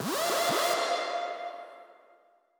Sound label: chime; chime sharp